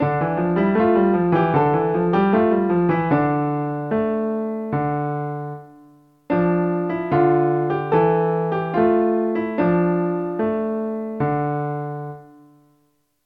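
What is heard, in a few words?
practice dotted 8th note in Dm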